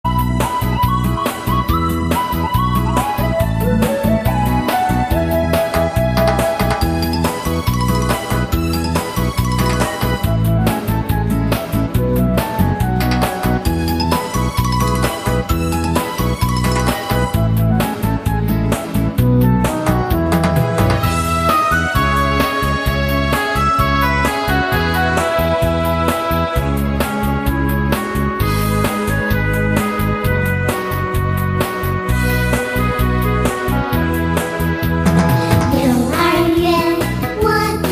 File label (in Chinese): 儿童歌曲 幼儿园